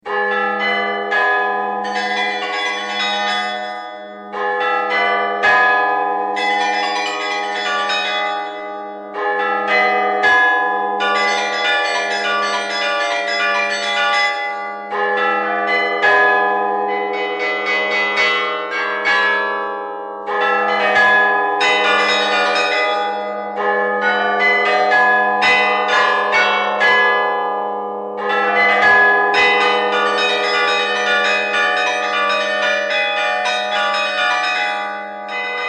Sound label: cycle for carillon Solitery Bells; poetry impression by Jonas Mekas